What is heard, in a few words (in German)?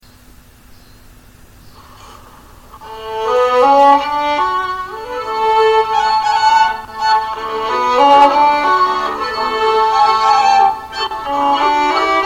Herkules Walzer